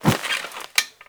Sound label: Classroom Chair Sit; Ambience; Warframe; TheNewWar; Zariman